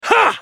spell cast effort; Vladimir; Character Voices - English

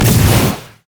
overdrive; LoL; blitzcrank iblitz

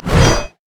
thundering blow; LoL; jayce

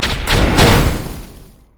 ripple effect; nautilus

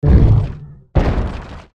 ancient golem dying

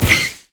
move quick; LoL; teemo

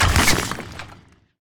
shatter; lissandra skin01

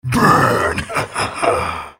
spell cast effort; Brand

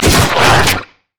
stretch strike; LoL; zac base

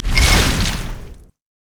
sonic wave; leesin skin05

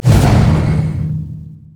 sad mummy despair; LoL; amumu

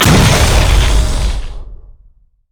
ring of frost; LoL; lissandra

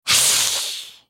spellcast effort; Cassiopeia; Character Voices - English

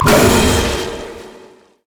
nami hydroblast; LoL; koi

